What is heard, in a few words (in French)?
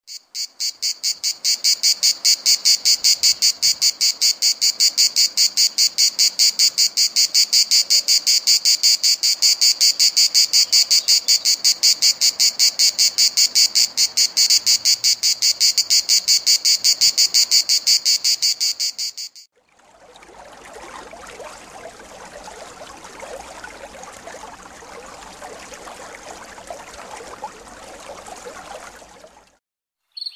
cigales